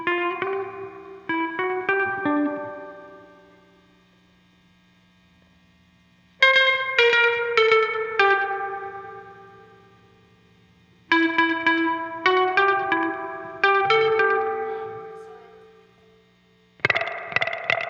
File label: guitar overdub; guitar overdub gareth